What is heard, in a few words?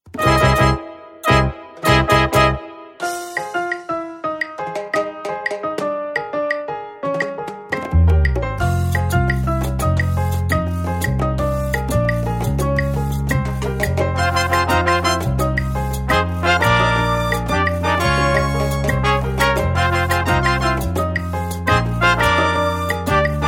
Playalong Latin